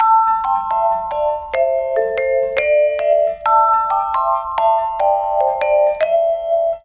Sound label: Lounge Vibes, loops